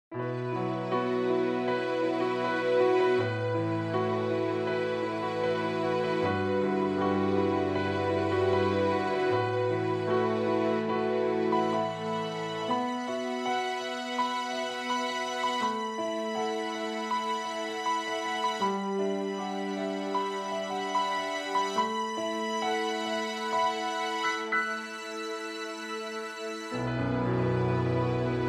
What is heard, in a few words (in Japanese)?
Strings Piano